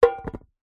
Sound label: foley tin metal cylinder lid on set down on concrete held hand muted; zapsplat